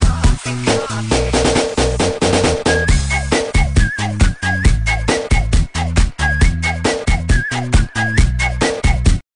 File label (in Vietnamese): Nhac; TikTok